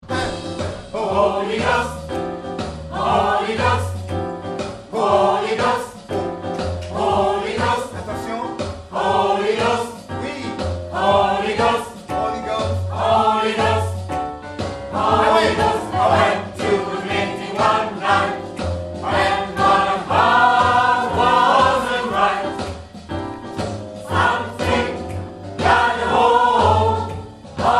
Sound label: soprano basse